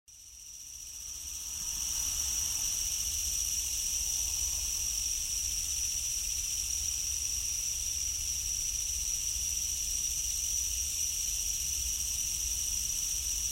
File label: grasshopper